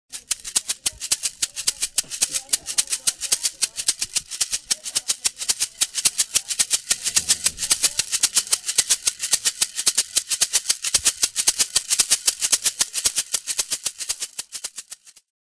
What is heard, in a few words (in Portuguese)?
instrumentos ganzá; cocos